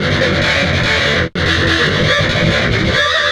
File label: GTR LOOPS